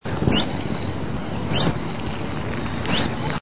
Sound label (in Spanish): voz canastero coludo